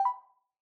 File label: dodoo noclick